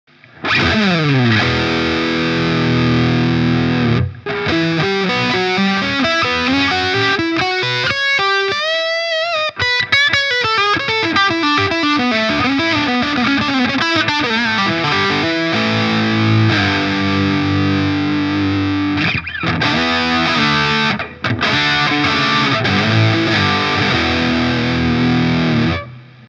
4 x12 sm57 ribbon fette zerre; Captor X

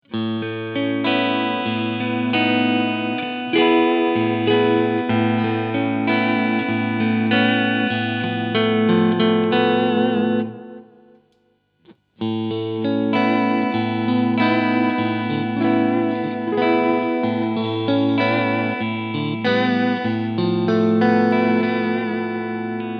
Chapman Guitars ML3 Pro X Alle Clean Sounds

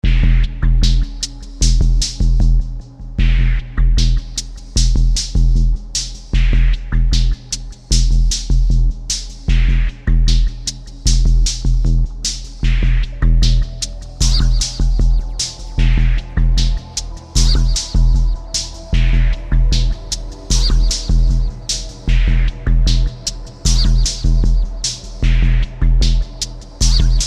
Atmosphärischer Drumgroove

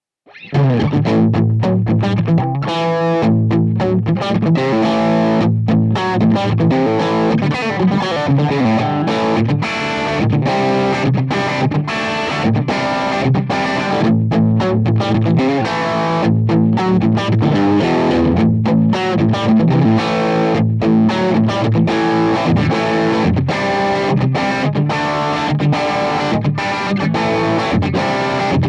Tele Low Gain Blubox Vergleich

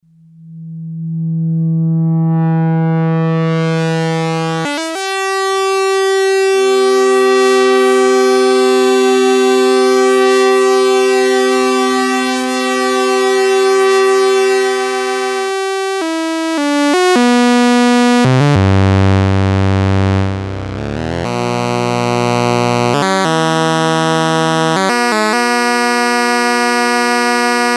Kurztest VCOs

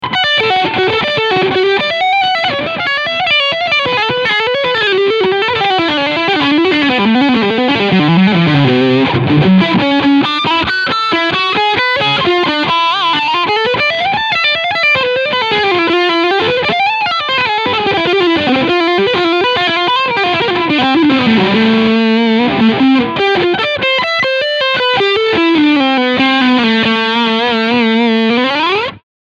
Harley Benton Dullahan FT 24 BKS Lead Sound Steg Pickup

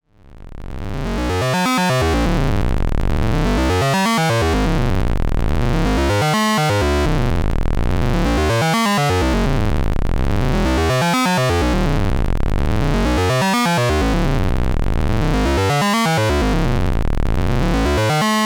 Xaoc Devices Leibniz Binary Subsystem 16tel Sequenz aus LFO

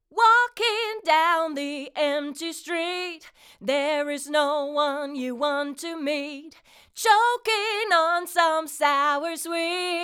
Summit Audio TLA 100A Vocal ohne TLA 100A

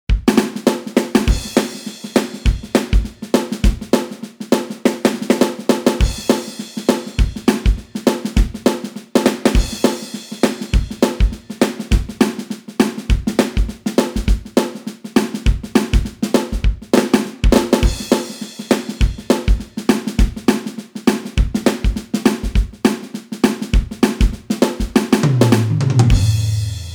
Stil Country

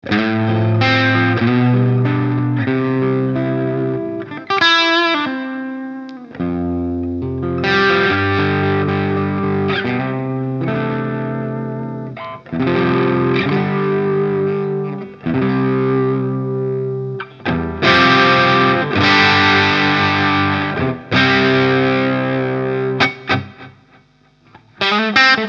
overhive gain 12.00 biene und wabe unten steg

